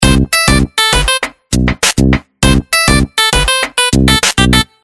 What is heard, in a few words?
Tango, ringtones; Okapia Air